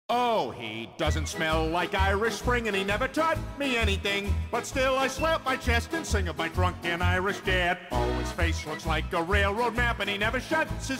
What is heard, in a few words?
Drunken Irish